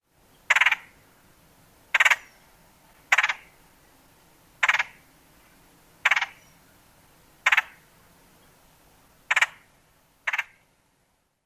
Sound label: rattle calls